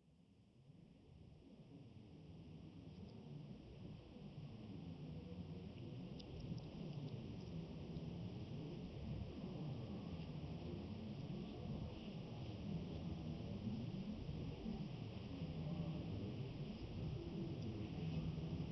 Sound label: Deathworld Ambience; gonner linux macos ps4 switch windows xbox one gamerip 2016